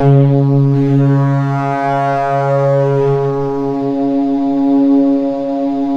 C#, Synclav FM; Big Fish Audio; SPACE2

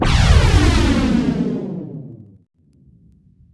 DOPPLER, WARHEADS; Spectrasonic Distorted Reality 1

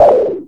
Percussive, Drums Perc One Shots

